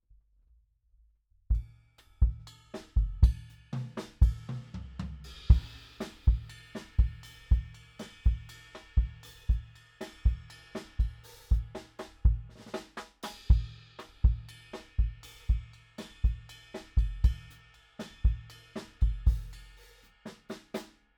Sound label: Kick